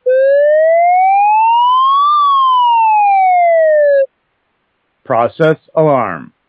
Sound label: Process Alarm; PAGASYS